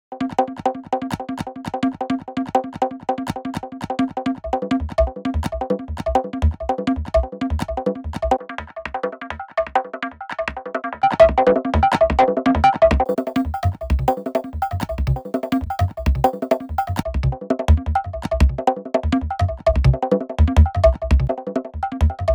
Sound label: Perc Tables for 3; ARPS